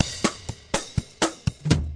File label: 122 bpm, cajun timestretch, drum loops and breaks